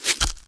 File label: arrow basic; AoM-ARTofMyth